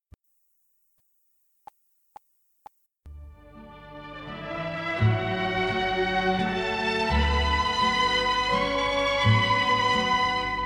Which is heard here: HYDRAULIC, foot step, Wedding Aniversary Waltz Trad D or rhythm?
Wedding Aniversary Waltz Trad D